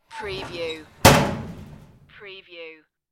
close locker door

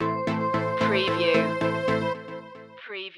reflections stinger

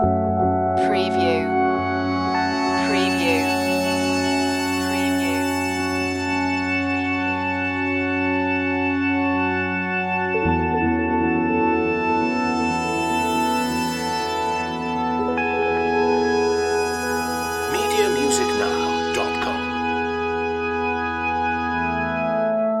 crystal underworld loop

